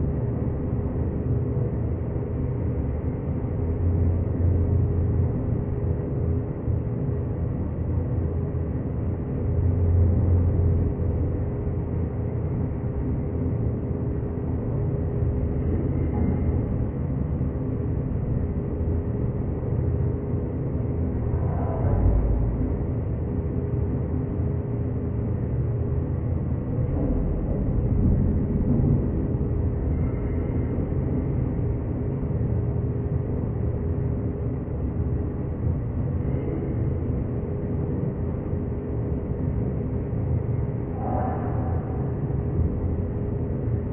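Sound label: Ambient Dam Interior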